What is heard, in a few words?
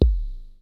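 bass drums, synth drums; Syntec; Wall of Sounds; TECHNO-BOX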